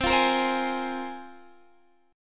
C 7th Suspended 4th Sharp 5th, C, down, Guitar, DADGAD